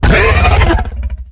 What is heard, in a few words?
queen jump; Attack of the Radioactive Thing